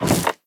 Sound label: ladder, step; Minecraft; Votive World